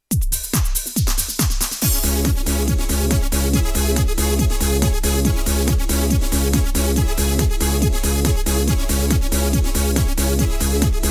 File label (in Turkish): mehter; mehter mix